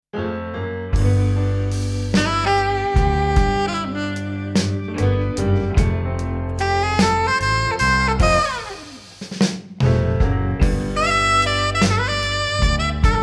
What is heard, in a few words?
Blues; Miami